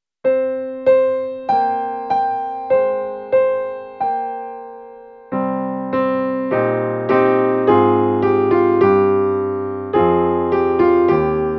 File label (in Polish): piano; LOGORYTMICZANKI